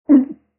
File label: female drown; Barotrauma; Real Sonar